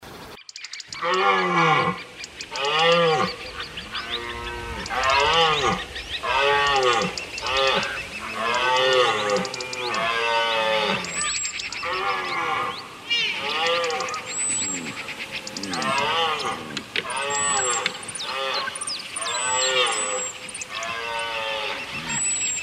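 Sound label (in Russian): звуки оленя в лесу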